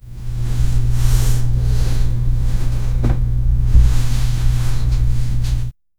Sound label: silence bed